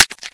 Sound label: Stroke